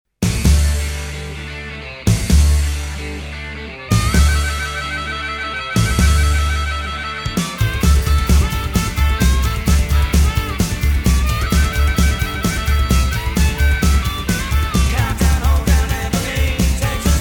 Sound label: ROCKAB, rockabilly; Rock’n’Roll